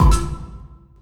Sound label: Oneshot